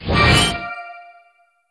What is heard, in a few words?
Portal Open